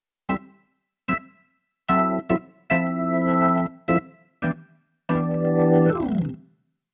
Keyboard Organ Jazz Organ

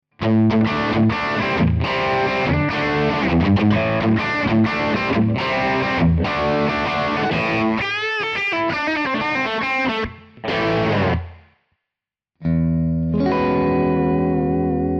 guitar Strato

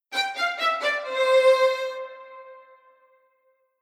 articulation

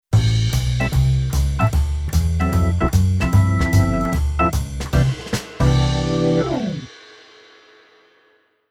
keyboard organ jazz

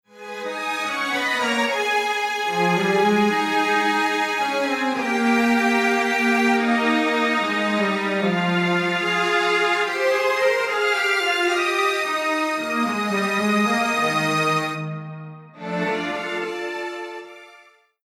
Strings Dual

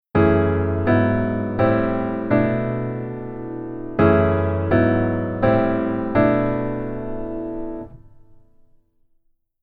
keyboard top note

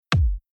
Future Bass FX Hit EQ On

